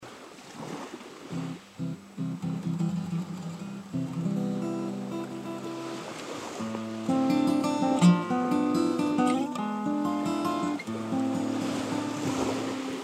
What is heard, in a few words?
guitar; crimea